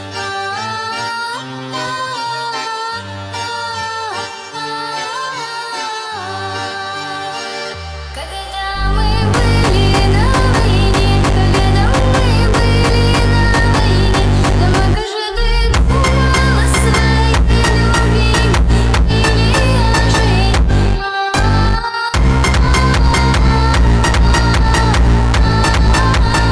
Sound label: Brazilian phonk remix